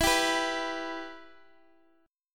E Minor E down

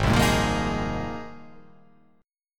G sharp 6th Add 9th G sharp

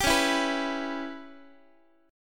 C sharp Minor 7th Flat 5th C sharp Guitar; C#m7b5 strummed; x,x,10,11,8,10; JGuitar

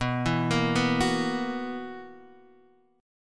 B Major 7th Suspended 4th B

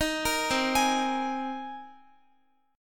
C Minor 7th Sharp 5th, Guitar; arpeggiated; Cm7#5; x,x,13,15,12,15; 4E,4A,5D,5G,5C,6F

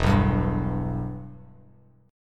E-Minor, Bass Standard; strummed; JGuitar

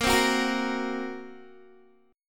Bb Suspended 2nd Sharp 5th, Guitar; Bbsus2#5; strummed; 18,15,16,15,18,x; JGuitar

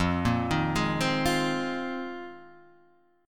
Bb 7th Suspended 2nd Suspended 4th F

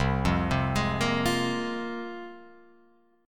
C 7th Suspended 4th Sharp 5th C Guitar Open F; C7sus4#5 arpeggiated

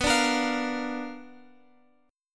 B Minor Major 7th Flat 5th Guitar Open G

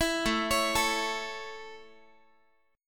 A Major A Guitar, chord; 19,17,16,19,x,x; 4A,4E,5A,5D,5G#,6C#; arpeggiated

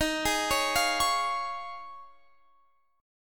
F Minor 7th Sharp 5th, Eb

